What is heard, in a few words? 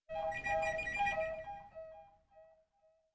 music only